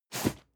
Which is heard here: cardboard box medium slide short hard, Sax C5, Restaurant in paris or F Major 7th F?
cardboard box medium slide short hard